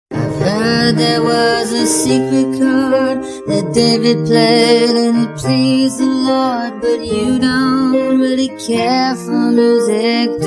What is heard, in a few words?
Rock girl, Sing